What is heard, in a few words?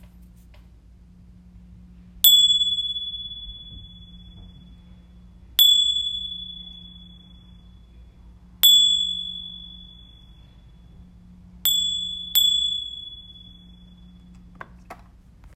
tingsha sound